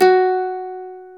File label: MANDOLIN